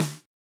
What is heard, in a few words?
RX8 SD, Yamaha RX-8